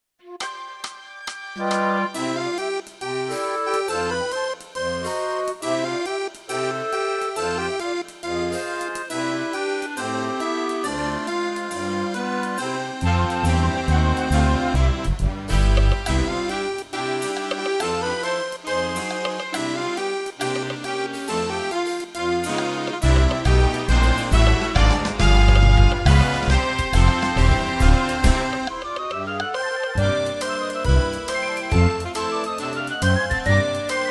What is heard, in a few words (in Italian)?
Fisa tutti